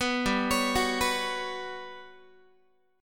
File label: G Major 7th Flat 5th, Guitar; GM7b5 arpeggiated